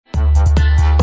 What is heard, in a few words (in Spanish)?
cortinilla; nebulosa